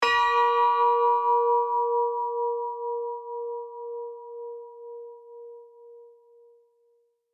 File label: Church bell hit long and sustained